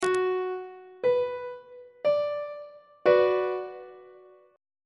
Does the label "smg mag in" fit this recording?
No